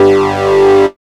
HARD G2, dubstep; Jack Sparrow; Deep Dubstep Progressions

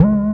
Synth Shot C#; Mutekki Media; Vengeance Electroshock Vol.2

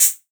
Shaker, Dubstep One-Shot Drums

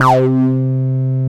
303 C3; Jack Sparrow; Deep Dubstep Progressions

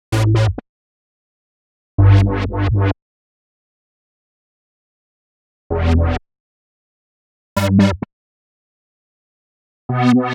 129 Em Bass, Complex Electro Synths; Prime Loops; Arena

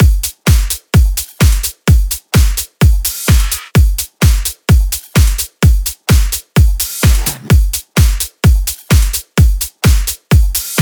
Electro House Beat; Mutekki Media; Vengeance Electroshock Vol.2; Electro House Kit 1

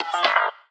G STAB, funky

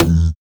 Percussive; Percussion; Mutekki Media; Vengeance Electroshock Vol.2

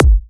Bassdrum

